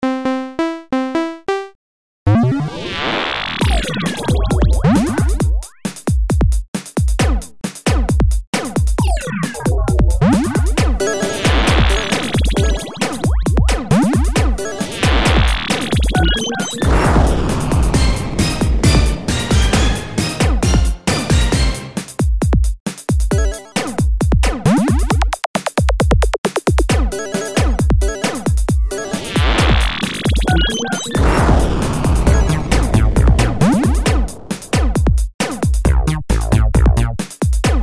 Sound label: nintendo beat